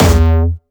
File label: Jumpstyle Kick E2; vengeance essential club sounds-3; VEC3 Bassdrums